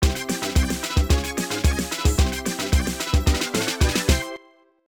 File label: Opening Fanfare; Pac-Man Arrangement; Arcade 1996